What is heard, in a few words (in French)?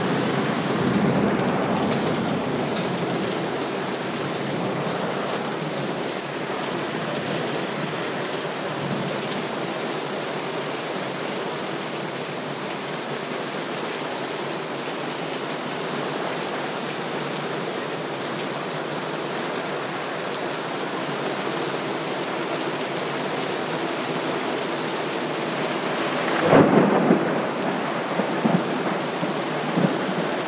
l orage